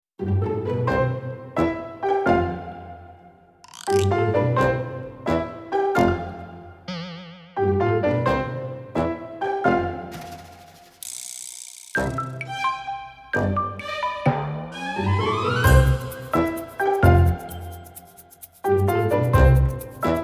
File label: childrens comedy, schastlivaia detskaia muzyka